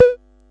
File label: silly elec fx; UNUSUAL KITS and FX; Silly Electro FX Kit